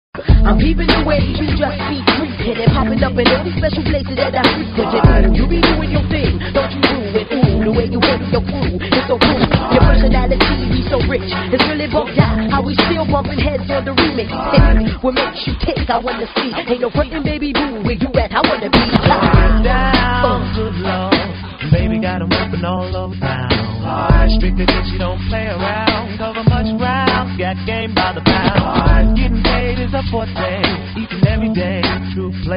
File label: funk; multitrax